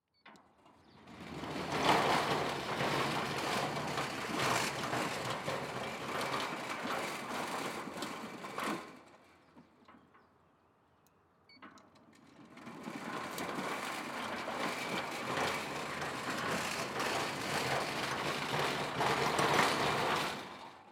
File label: Sliding Gate Motor